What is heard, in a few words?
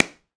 Pickelball Paddle Hit Ground Medium, Ball Collision